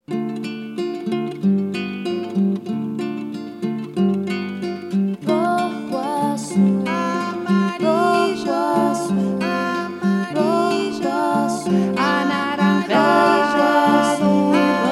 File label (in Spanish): Canción en modo menor para acompañar con ostinatos melódicos y movimientos corporales; Arco iris